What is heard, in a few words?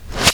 REVRS KASA, PERCUSSION REVERSE